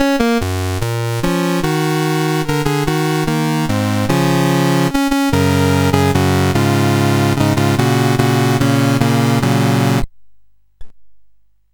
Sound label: Summer Games, CSG8580R5